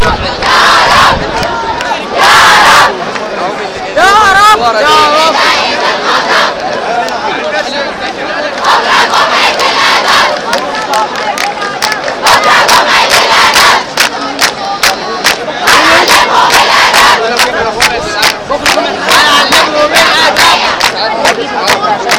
a group of women chanting in a special women only protest inside the square